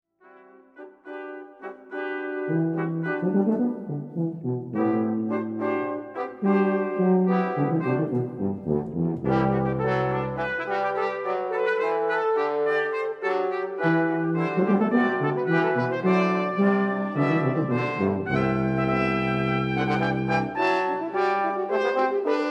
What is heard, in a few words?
Fanfare